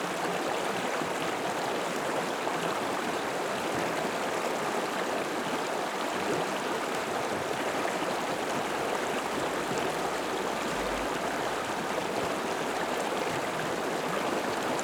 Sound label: River Small Gurgling between Stones; Justsoundeffects; Streams and Rivers